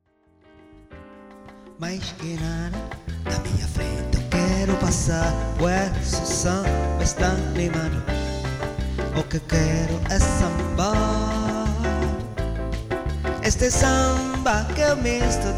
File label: PIANO BAR